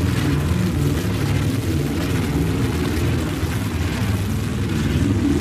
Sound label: generator ambient loop damaged; coop; Titanfall 2